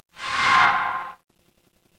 transition sweep

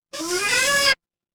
cartoon squeak

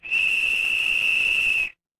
instrument whistle

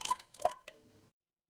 water drip

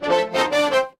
feedback level up

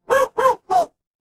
animal dog bark

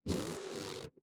cartoon stretch

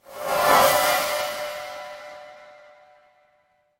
magic scifi spell

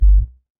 ui beep

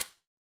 impact metal hit

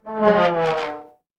feedback fail

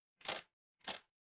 office camera

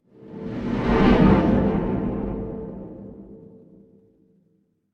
magic scifi teleport

